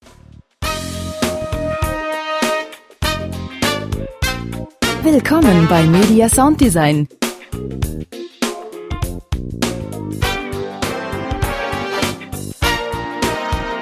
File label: jazz late night show